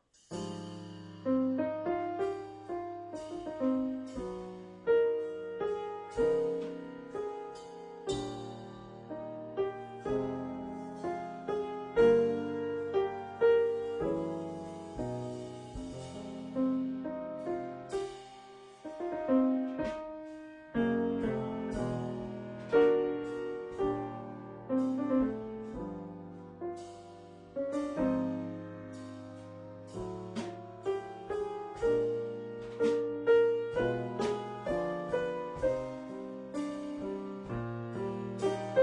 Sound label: piano drums